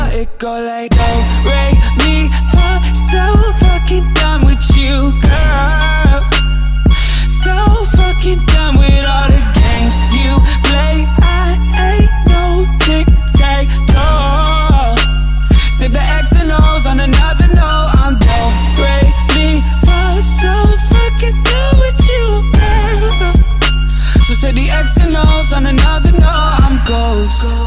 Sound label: REMIX